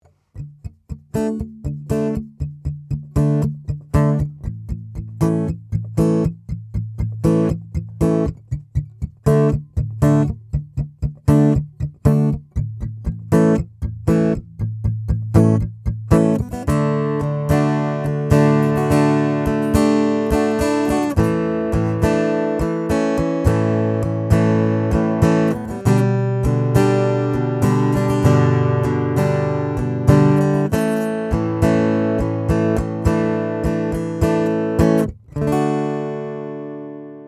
Cole Clark Fat Lady 2 strumming piezo25 face75 mic70